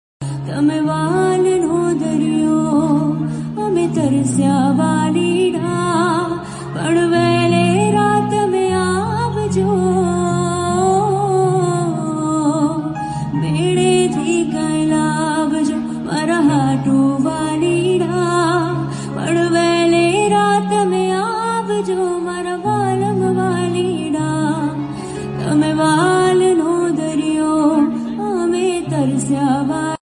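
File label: Gujarati Song Ringtone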